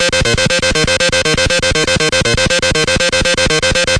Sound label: 120 aggressive mono synth